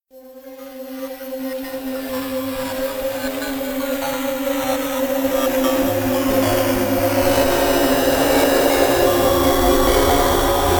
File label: Portal Loop; high strangeness; wii u